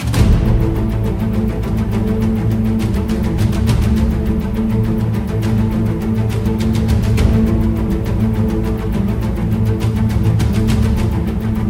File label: boss soundtrack; dungeon quest roblox; Northern Lands